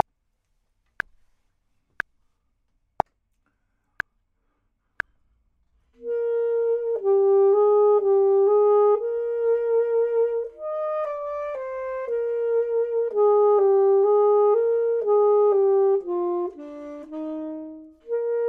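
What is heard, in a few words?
top, Saxophone and Clarinet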